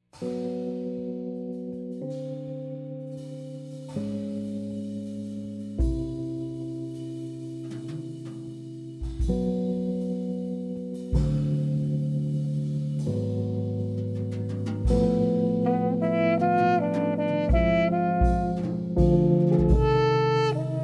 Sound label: call loop